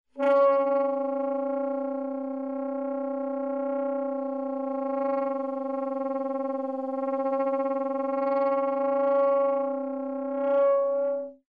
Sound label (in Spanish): Grano